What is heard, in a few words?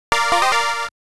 Fanfare for treasure chest appearance; Libble Rabble